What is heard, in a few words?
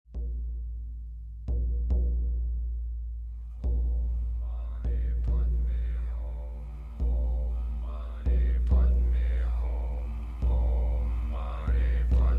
Contemplation Chant